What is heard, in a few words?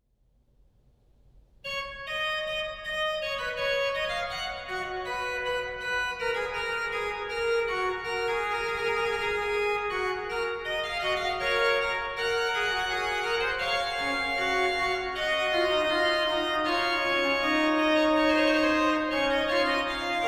Fuga G-Dur BWV 576